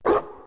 bark; Smite; Cerberus

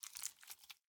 kraken slither; smite; poseidon

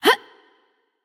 grunt jump light; smite; voicepack; hel

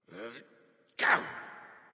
grunt; Smite; bacchus

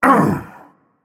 grunt hit; erlangshen

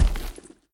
footstep; god ares; smite; ares v4 skin16

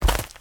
footsteps; smite; god merlin

